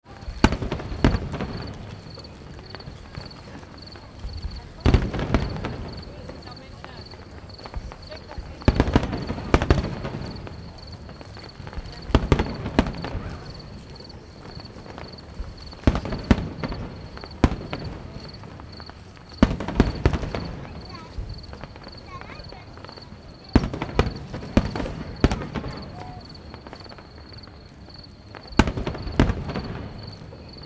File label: Park ambience night time fireworks display